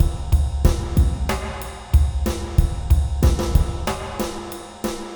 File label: Triphop 93